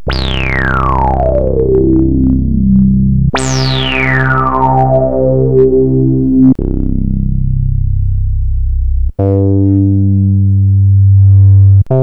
Kawai SX-240

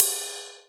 Ride Cymbal, Alesis HR16B drum machine

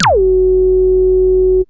MOOG PRO, SEQ FX; Vintage Voltage

